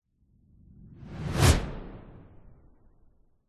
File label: transition whoosh fast; sound design; zapsplat